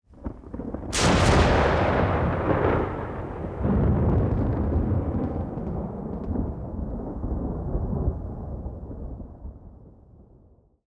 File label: thunder; jungle journey